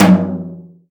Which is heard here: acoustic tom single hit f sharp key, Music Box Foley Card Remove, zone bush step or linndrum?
acoustic tom single hit f sharp key